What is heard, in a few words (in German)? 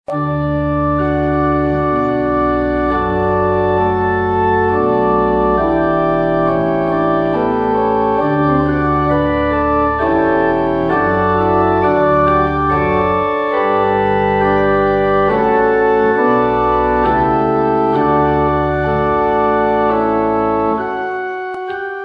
Air in D Dur; Battishill